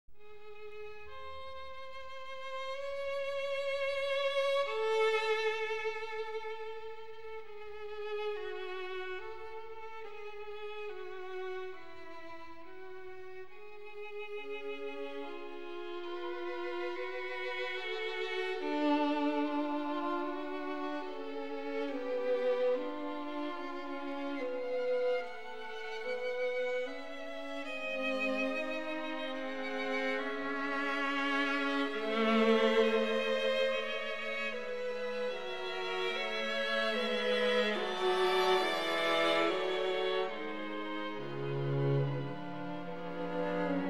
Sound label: String Quartet in C-Sharp Minor, Adagio ma non troppo; String Quartet No. 14; Op. 131; Ludwig van Beethoven; Beethoven - String Quartets Nos. 1-16